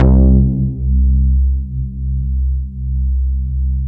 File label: MATRIX 12, OB Synths, Analog; E-MU Formula 4000 Series Vol. 3; Analog Odyssey